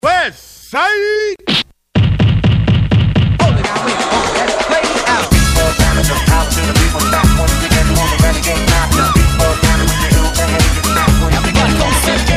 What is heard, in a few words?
Techno Remix